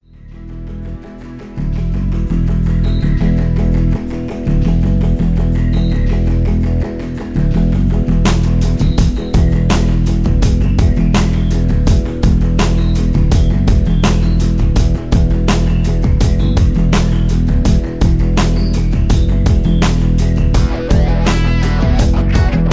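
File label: Karaoke Track; Top Performer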